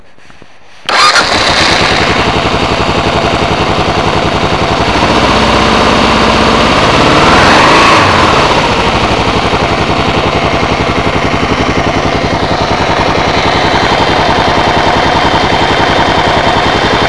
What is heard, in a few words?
Engine Noise, klr650; what's this noise